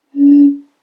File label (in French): Bouteille souffler